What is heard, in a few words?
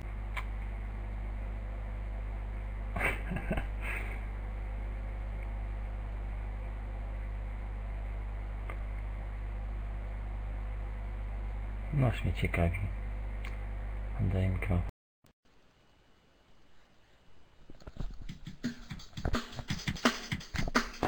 turbo dnb